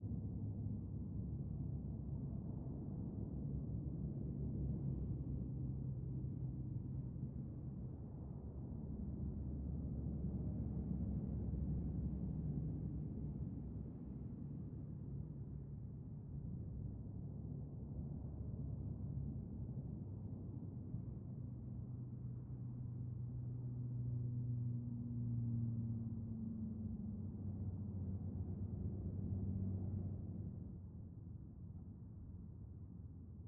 INT Haunted Sewers, Roomtone, Below Traffic, Rumble, Distant; 344 Audio; Haunting Ambiences